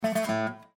Windows Hardware Fail; Windows 7 Sounds; Delta

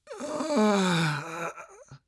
moan, male; Half-Life 2

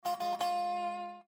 Windows Feed Discovered; Windows 7 Sounds; Delta

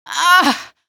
pain, female; Half-Life 2

